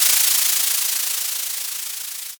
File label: sparkler